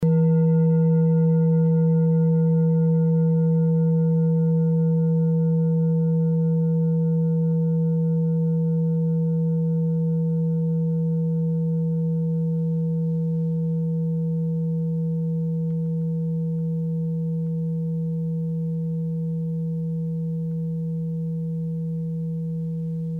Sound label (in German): klangschale ladakh